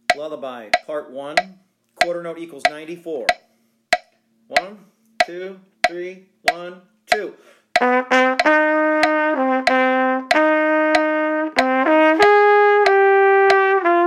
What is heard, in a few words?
Easy Duets, Lullaby, qn=94, trumpet; part 1